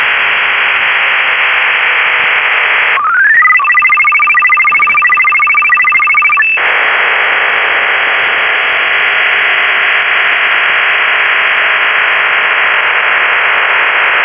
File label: OFDM-59 hybrid, 1700 Bd serial tone + 30-tone 40 Bd MFSK + 59-tone 40 Bd OFDM; 59-tone OFDM with 40 Bd MFSK preamble; Hybrid 59-TONE OFDM WAVEFORM